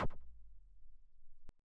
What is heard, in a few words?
toggled; gnome-audio-k1; gtk-events